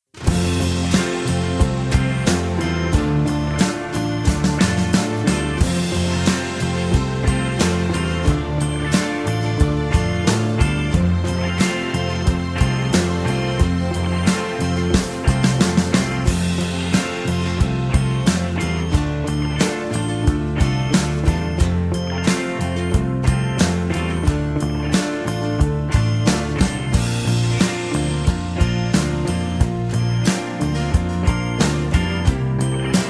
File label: (F) No Backing Vocals